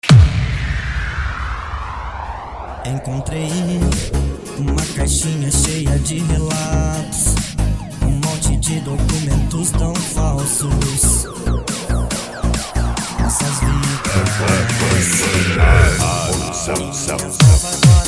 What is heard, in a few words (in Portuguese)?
sertanejo remix